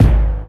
strong bottom end steel kick drum sound g sharp key